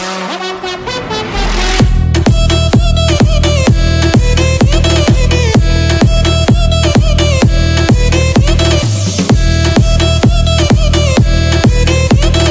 Balkan EDM

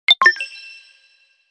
Stock Notification; Alloy; HTC 10 - Sense 8 Sound Suite

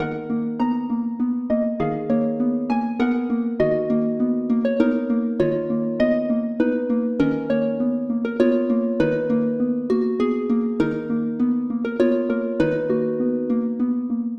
Harp Tristesse

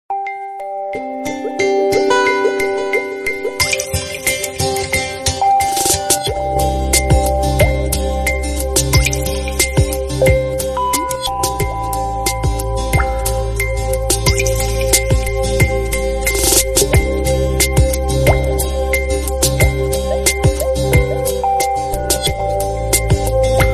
samsung galaxy s9 rain drops

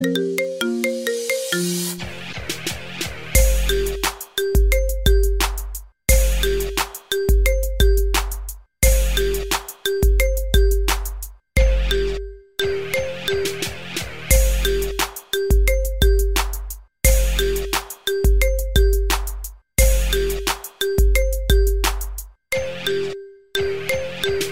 Iphone Marimba

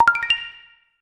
Stock Notification; Major 1; HTC Touch Pro2 And Diamond2

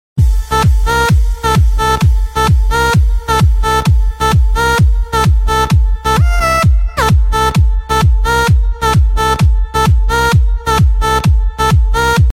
electronica

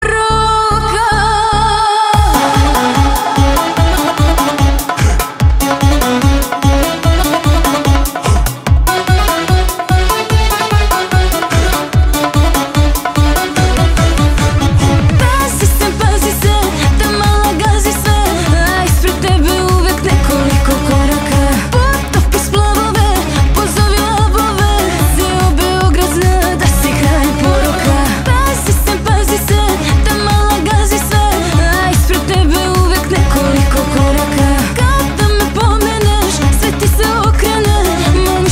turbo folk

